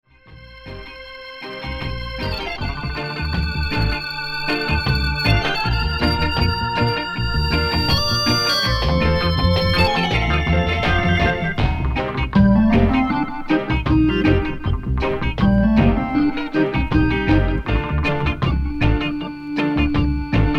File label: instrumental reggae